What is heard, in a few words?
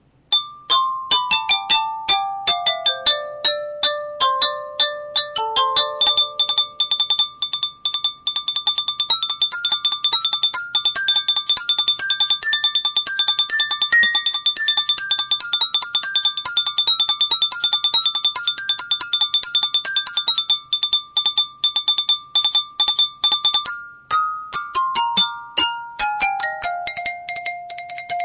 xylophone; western